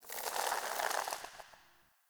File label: Foliage Growth A; Powers; Fey Eldveil; Eldveil Stillness